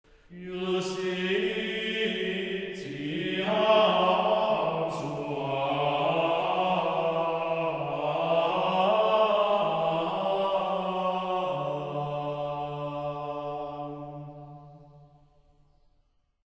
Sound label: organum quadruplum; Perotinus; Viderunt omnes